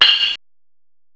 TAMB; M8; CMI Factory

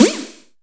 Shock Snares, Percussion

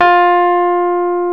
PNO, Rhodes Mk.V; Keyboards of The 60's and 70's

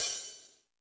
Jazz Drums, E-MU Pro-Cussion; E-MU Pro-Cussion-1

